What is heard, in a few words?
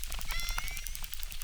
Chirp, acquarium